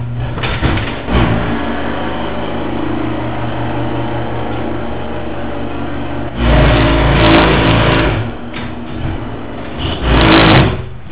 stripped sears chamberlain liftmaster gear, garage doors